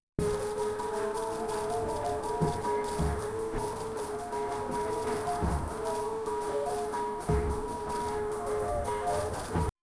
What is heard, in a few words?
sound effects drum beats; open field